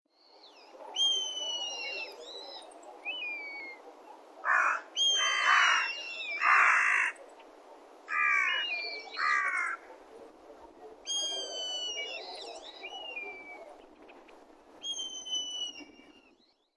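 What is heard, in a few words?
eastern buzzard, toda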